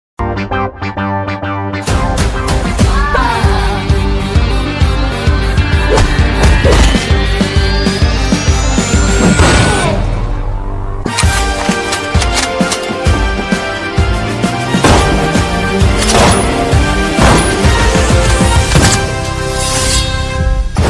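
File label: teaser background music ringtone new movie background music ringtone telugu background music ringtones; raja vikramarka; karthikeya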